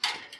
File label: Locker Open; Phasmophobia